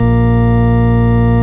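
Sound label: Vox A#2, organ Continental; Keyboards of The 60's and 70's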